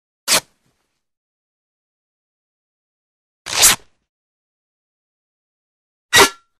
Sword Rips Cloth Sword Cuts Cloth Light & Quick; Hollywood Edge Foley Sound Library

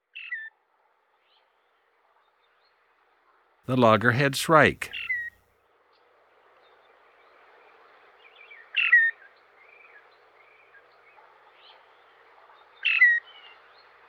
Loggerhead Shrike; Eastern North America; Dan Gibson; Wildlife Identification By Sound

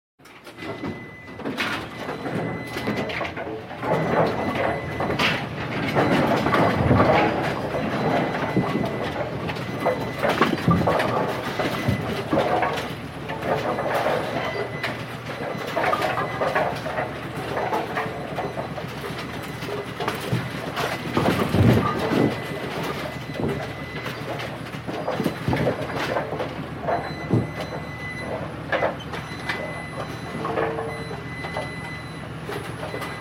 Bowling Ball Retrieval Machine Close Perspective; Sports

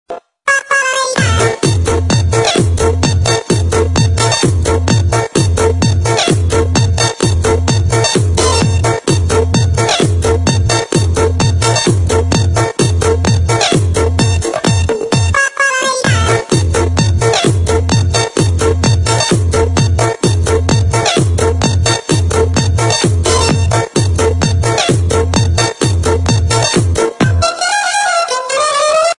Funny Remix Ringtone

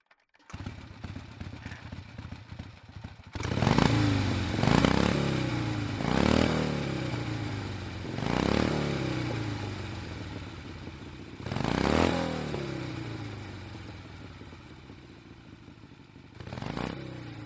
Harley Revs; SOUND EFFECTS1

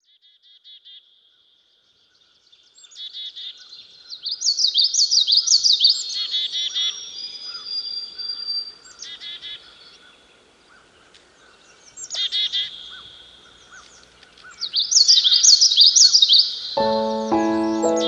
common yellowthroat

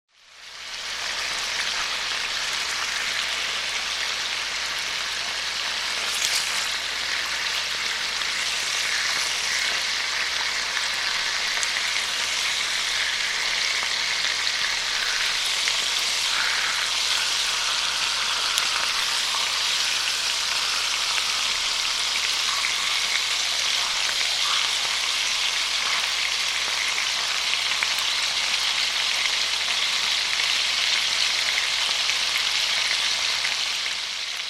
Frying; SOUND EFFECTS1

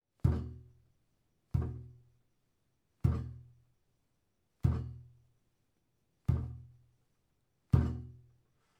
Glass Table Fist Hits on Surface; Foley - Props; Foley Essentials

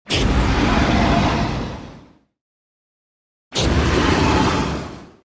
Fire - Huge processed electric ignition x2; Hollywood Edge Sound Designers Tool Kit

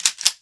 bolt, gun shots; 1000fx

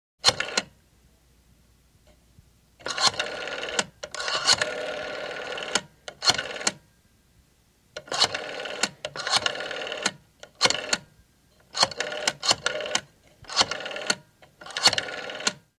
Rotary Phone Dialing Generic Phone Number; Telephones

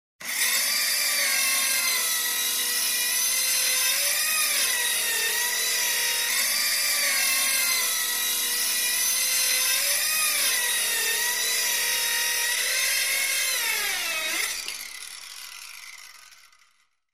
Power saw; SOUND EFFECTS1

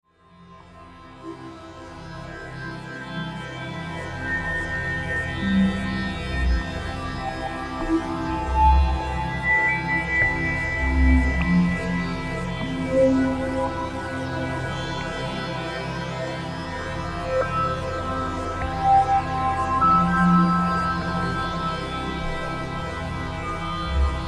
Sustain Atmosphere Chords; Beds

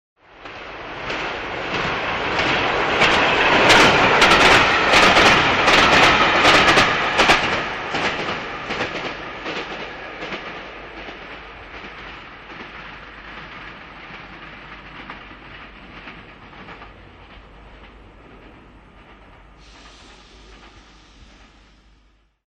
Train By (Fast) Electric Train Drive By; Hollywood Edge 3-D SFx

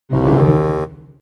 Synthesized bassoon cartoon; Music effect; Hollywood Edge Sound Designers Tool Kit

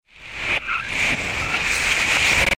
Noizzer; Best Of N2O Library; Breakers & Transitioners

